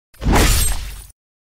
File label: strike blade strong